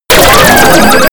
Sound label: arcade playing